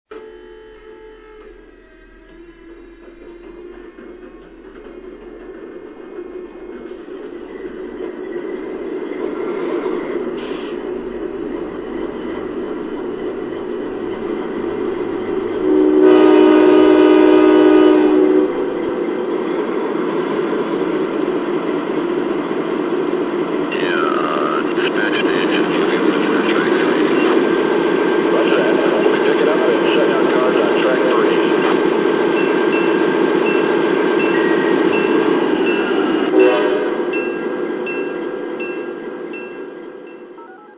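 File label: GE DASH8, locomotive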